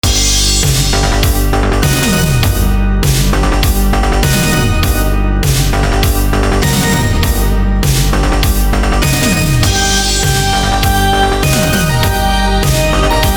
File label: (Synthwave cover)